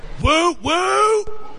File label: Whoop Whoop